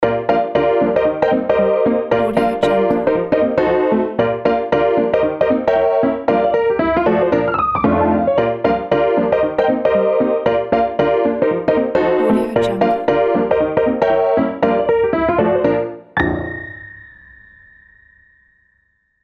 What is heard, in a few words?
comedy piano logo